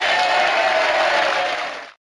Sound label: hit combo; mother 3